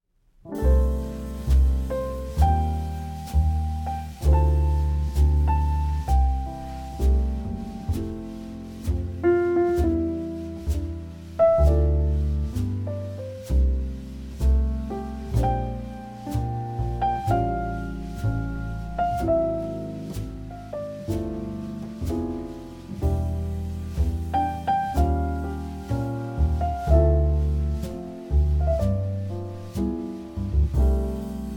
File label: jazz lullaby